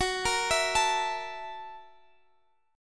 F sharp 7th Sharp 9th, down

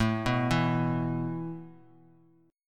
A Suspended 2nd A down, chord; arpeggiated; JGuitar

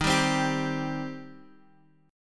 Eb 6th Eb down Guitar Drop D

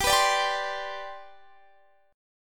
G Major Add 11th, G; Gadd11 strummed; JGuitar

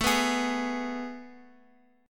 A Major 7th Suspended 2nd, Guitar, Open F; AM7sus2 strummed; x,x,x,4,2,3; JGuitar

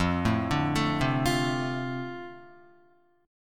F 6th, Guitar; F6 arpeggiated

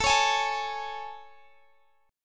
A Major 7th A, Guitar, Open G

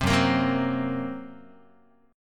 F sharp Major Flat 5th F sharp Guitar; F#Mb5 strummed

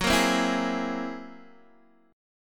F sharp 9th Flat 5th, Guitar, Open D

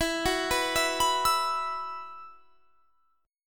E Suspended 2nd E, down; Esus2; arpeggiated

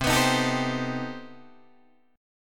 C Minor Major 13th C down Guitar DADGAD

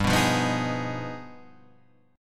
G Augmented 7th Flat 9th; Gaug7b9 Chord {3 2 3 4 4 4} strummed

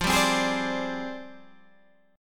F 6th Suspended 2nd Flat 3rd F, chord

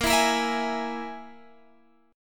E Major Flat 5th

